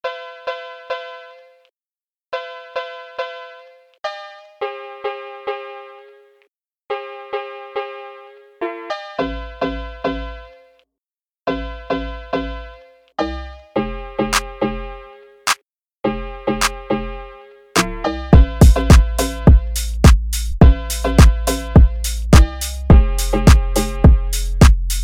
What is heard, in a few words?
phonk